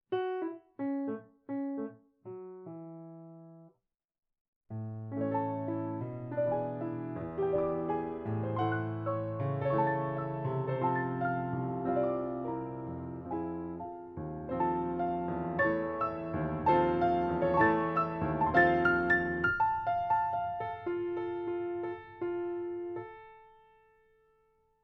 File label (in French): Valse; Marie Jael